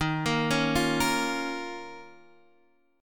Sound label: Eb 7th Suspended 2nd, Guitar, Half Step Down